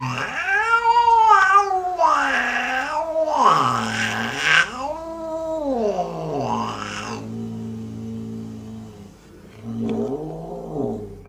Cat Angry; افکت صدا حیوانات; Animals

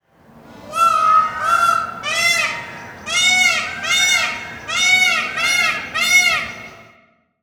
Peacocks and Birds; افکت صدا حیوانات; Animals

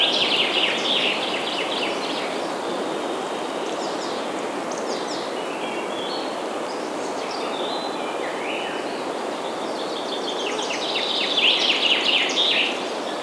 Forest Birds Sounds; Loop; افکت صدا حیوانات; Animals

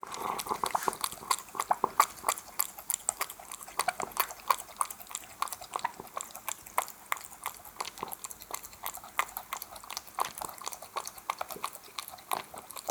Cat Eating Wet Food; افکت صدا حیوانات; Animals

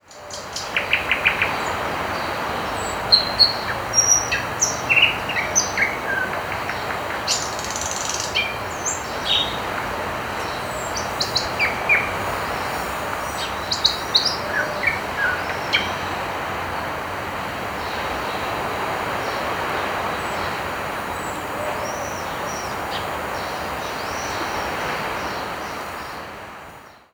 Singing Birds in Seaside Park; افکت صدا حیوانات; Animals

